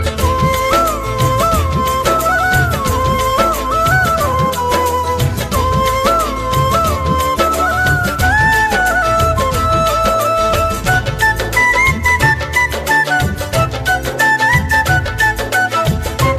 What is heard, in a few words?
Flute Misic